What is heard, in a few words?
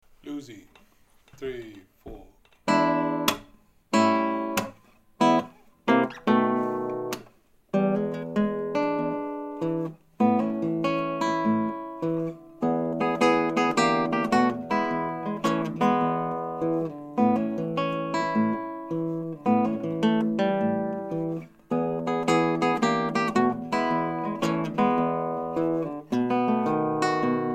Bluesy, gitarre